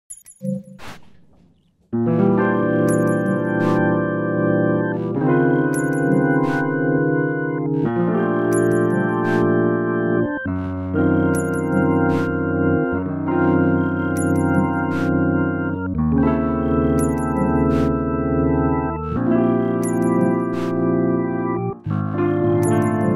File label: organ; arnaud-romet; free-fruits; crusey-organ